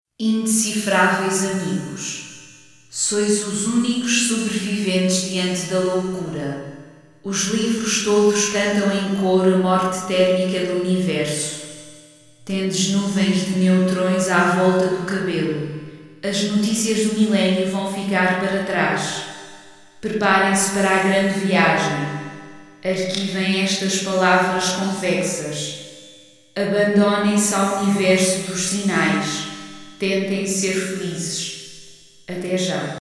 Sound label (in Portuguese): alerta